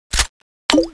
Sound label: reload clip in, weapons; csco; csgo; bloodhunter